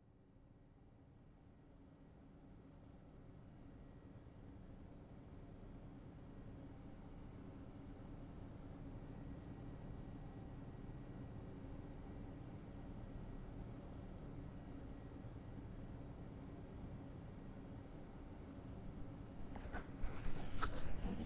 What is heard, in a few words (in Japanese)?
精密耳掻き・左耳き（ピンセット、スプーン、スパイラルを使い分ける精緻な左耳かきと、安眠導入ウィスパー）; アルジェ; アルジェの精密耳掻き・左耳き